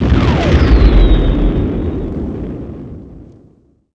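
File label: combat explode z95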